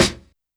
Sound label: Snare Break, YAMAHA RM1X